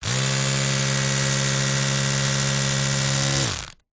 household electric toothbrush on off short burst close up; zapsplat